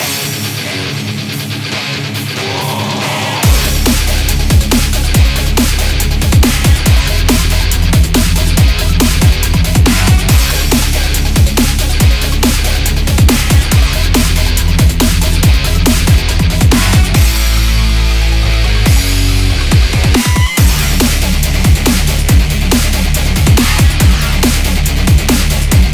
Ring The Bells; I Hate Running Backwards

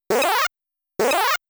Rock - Hit; Dig Dug

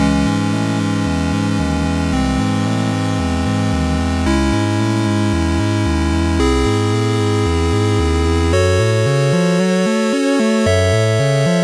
Mountains Loop

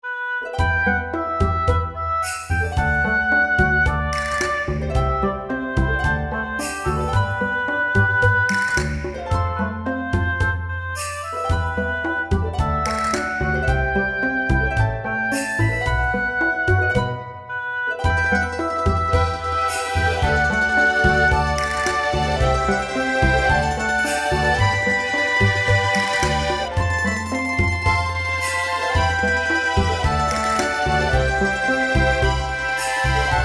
forest; Nelke & the Legendary Alchemists Ateliers of the New World

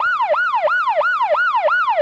Police Loop

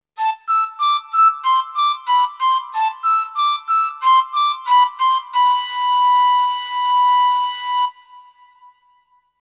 Earthen Flute; Dinosaur Resurrection

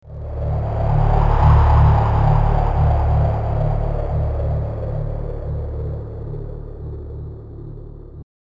Logo (SNES Version); Blizzard Entertainment

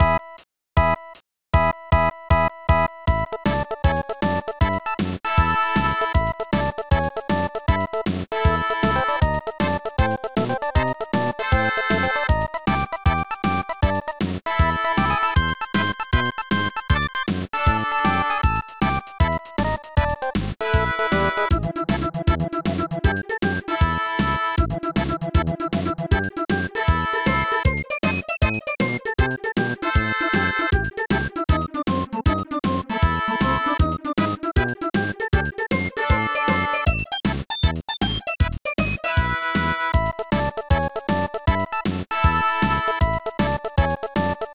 Rejected Dance Tune; Wedding Peach; SNES

